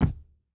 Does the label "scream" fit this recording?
No